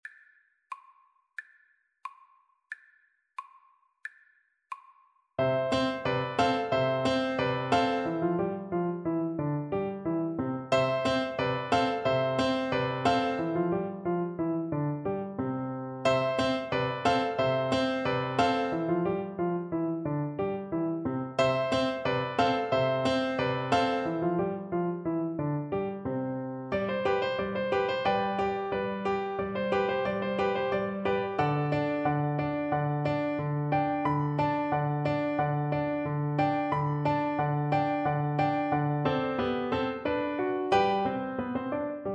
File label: musette, XYL; Percussion